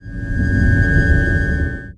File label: Meteor; Playable Tutorial Level; TR3